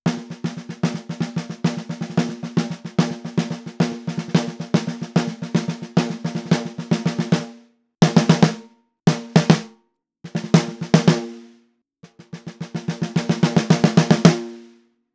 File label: snare drum snare sm57